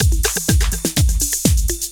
LOOP 124; Sounds Good - Eurotech

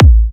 Kicks A; markus hakala

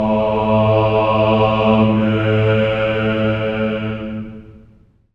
amen; Spectrasonics; Symphony of Voices; GR AMENS

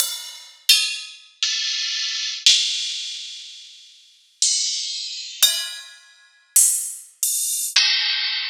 Rides

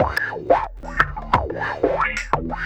Orbish Funk, Synchro Funk, Beats; Sonic Foundry (Sony Creative Software)

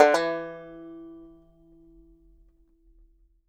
BANJO NOTES, E 3; Sampleheads; Whole Lotta Country 1 & 2

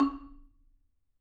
MMEDD C#, Marimba; Sampleheads - Dave Samuels Marimba & Vibes

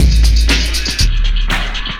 LOOP120; Sounds Good - Eurotech

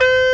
BA WU A#2; AKAI S6000 CD-ROM - Volume 1

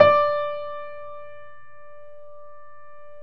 PIA GRND, Roland

